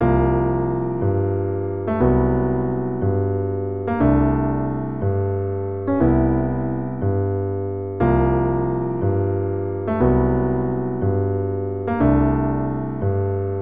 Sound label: Piano; The Legend of Zelda Ocarina of Time